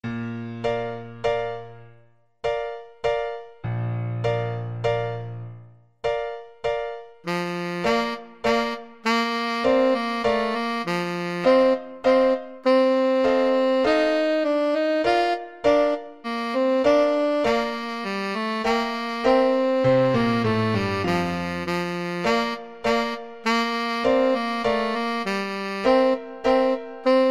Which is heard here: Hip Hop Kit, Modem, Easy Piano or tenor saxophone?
tenor saxophone